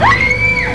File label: Scream; Buffy